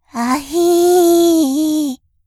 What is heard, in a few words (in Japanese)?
あひぃ～